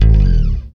BS CHPSTK, Chapman Stick; Roland L-CD701

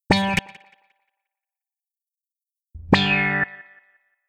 Wah Wah Gtr; Guitar

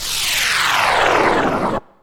WHOOSHES; AMG Megabass REMIX! VOL-2

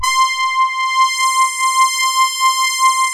GR LEAD, JV-1080; Syntec; Wall of Sounds

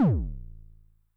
BD MOD [Pitch+Depth+Overdrive], 909mod, BASS DRUM; classic machines

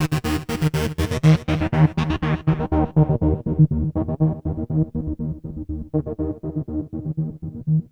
NRG Pad; Sonic Foundry (Sony Creative Software); Trance NRG VOL-1

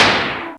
fight; East West; Global Sounds

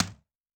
Distrt L, Tom, Yamaha DTXpress-II

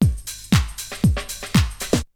Drumloop; ZERO G - Datafile DISC 3

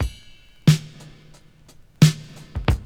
breaks

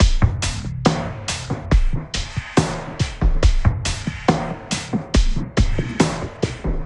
SloMo Beats, Loops 140 BPM

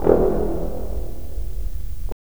BRS, Roland LA Composer

